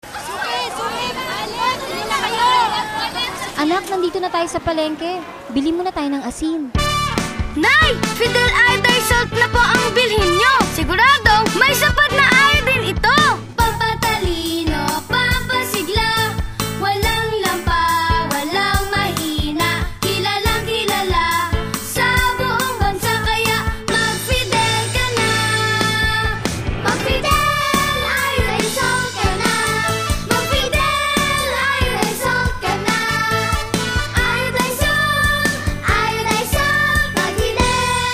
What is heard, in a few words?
Jingle